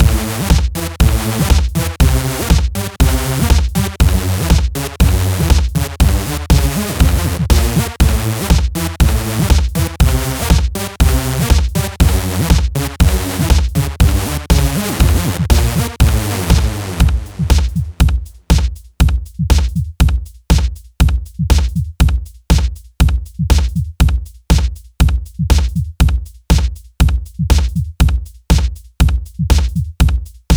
Lobby; Inkopolis Square

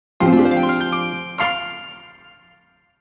Jingle Magic Trap; culdcept revolt original soundtrack dynamix 2017

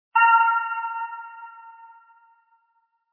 Level Up

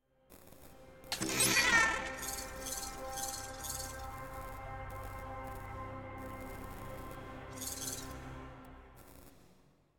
Cutscene ambience; super mombo quest

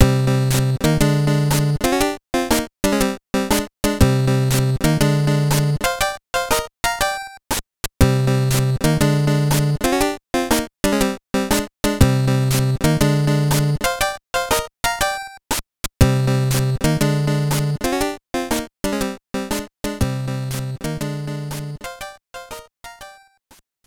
C Eb D F G F Eb D C D

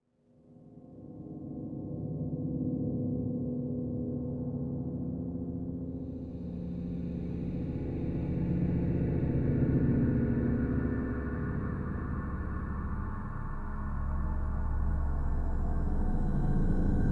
Ambient; Haegemonia: Legions of Iron

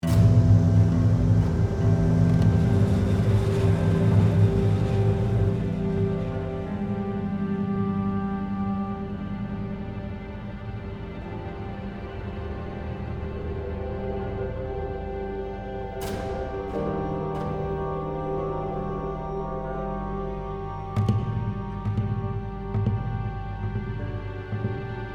Stealth (Loop)

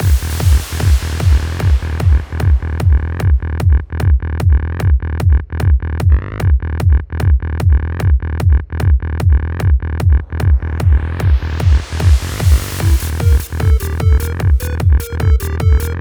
Music Rave Cave; Dungeon League

